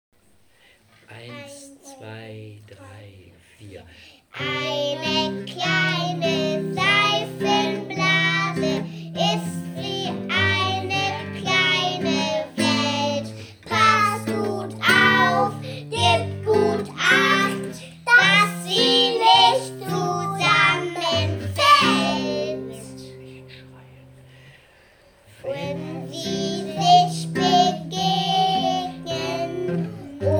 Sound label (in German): seifenblasenlied